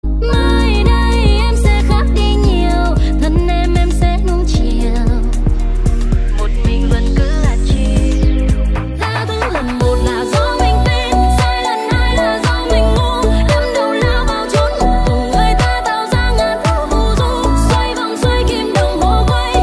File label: remix drum